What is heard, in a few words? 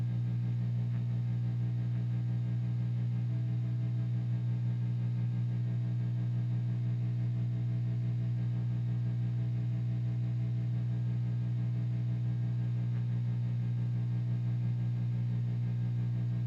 wurlitzer